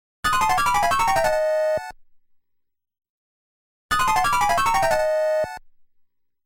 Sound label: Enemy Appearance Sound; Kaijuu Densetsu The Tale of the Shell Monsters